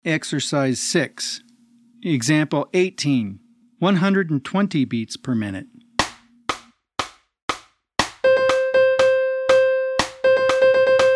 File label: 120 BPM